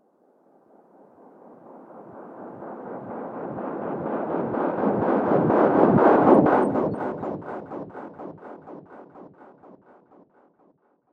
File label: EFX